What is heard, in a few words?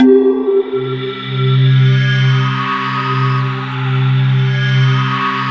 actual syn C3, ethereal atmospheres; Soundscan - Ethereal Atmospheres